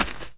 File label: FS Dirt; kult